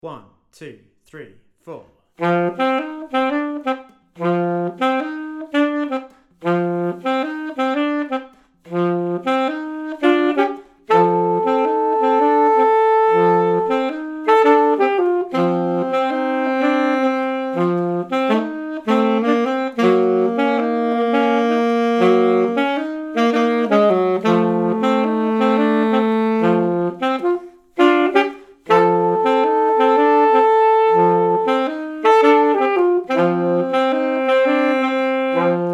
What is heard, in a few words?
Alto, Sax